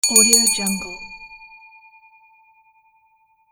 large hand brass bell short ring

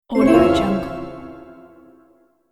egyptian notification

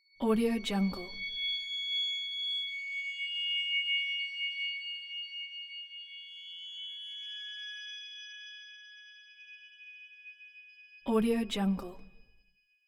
shimmering shining sfx

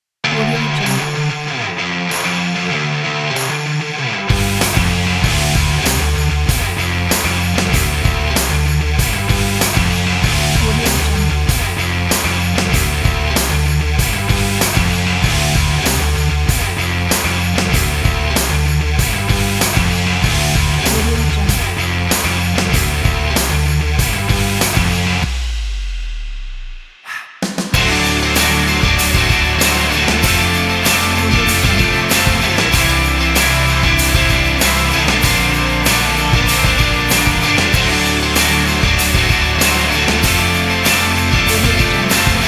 dirty rock background

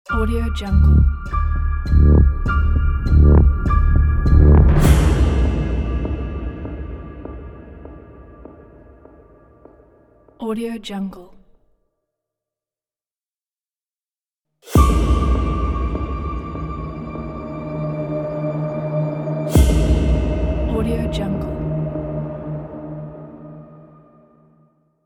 dark horror stinger

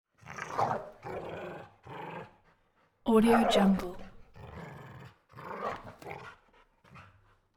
mutt growl; collanderson

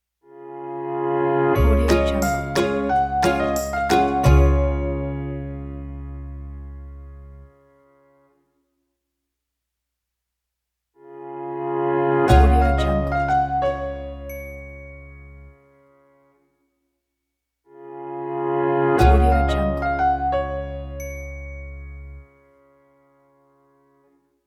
happy logo

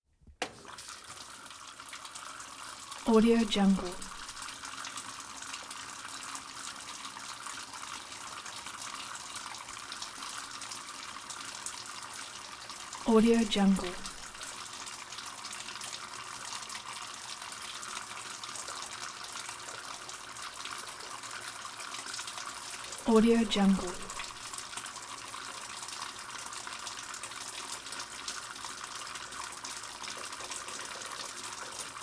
washing machine

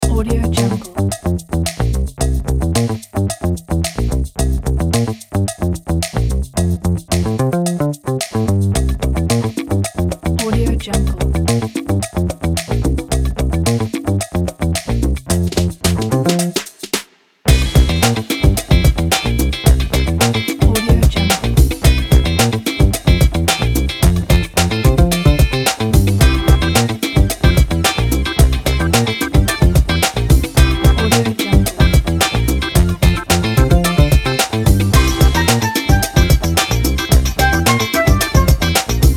this funk groove

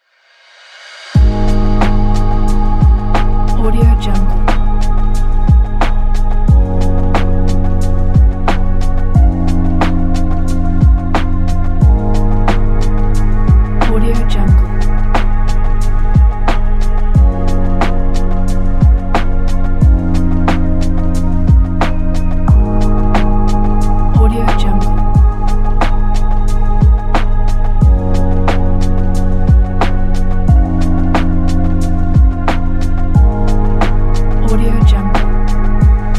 relaxing dreamy downtempo

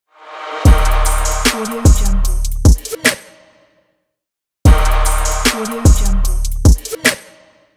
for intro logo

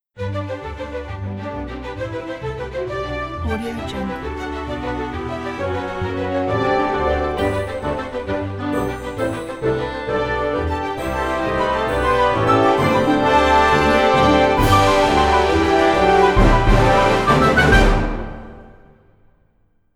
classical intro orchestra

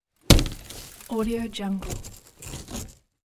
smashing car windshield

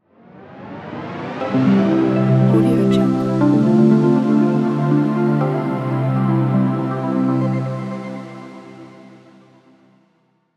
simple magical logo

